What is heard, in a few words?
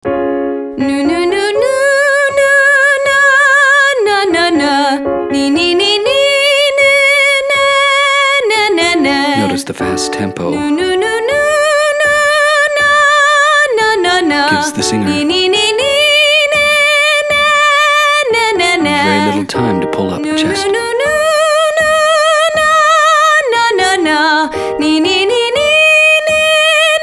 Exercise newa no nee nay females